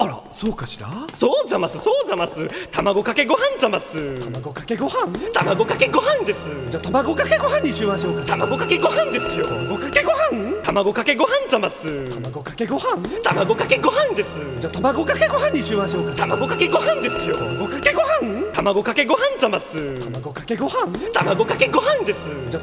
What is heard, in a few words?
RINGTONE